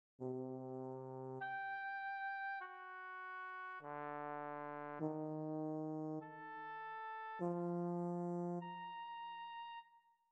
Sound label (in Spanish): melodia, dictado